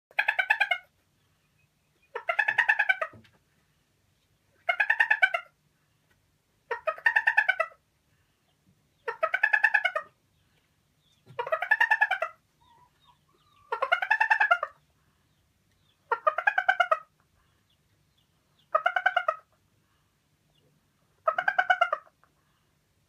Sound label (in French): Poule araucana qui pond